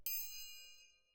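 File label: Percussion, Jazz for piano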